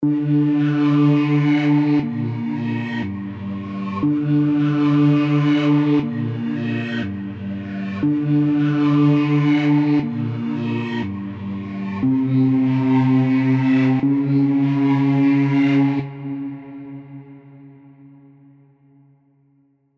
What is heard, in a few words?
Bass PAD Macro Modulation; Heavyocity Mosaic; Digital Soul